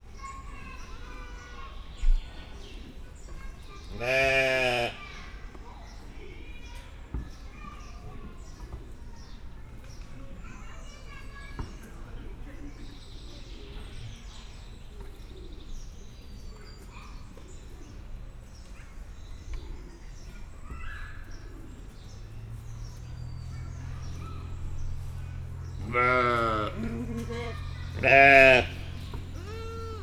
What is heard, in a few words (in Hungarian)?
szamár patadobogás; szegedi zoo 2011